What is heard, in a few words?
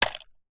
foley wood hollow small break open; zapsplat